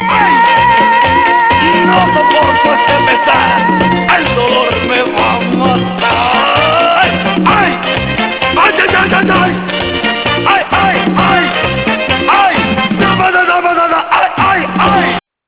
ay ay ay

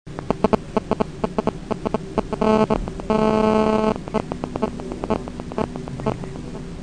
cell phone; Cellular Phone Interference

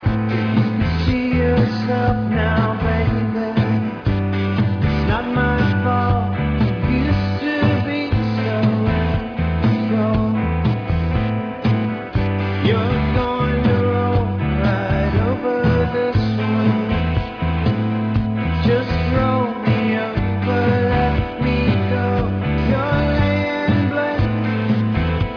bang

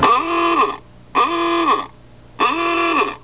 horn

